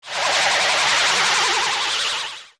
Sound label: Attack; Morpha; zelda; OOT